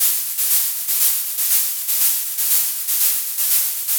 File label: Gated Noise 120BPM; musicradar